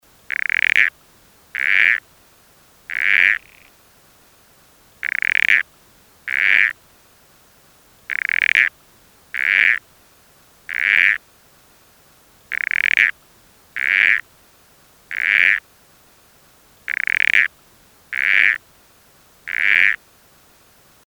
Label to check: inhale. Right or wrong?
Wrong